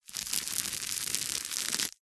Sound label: office parcel tape strip stuck to carpet finger rub along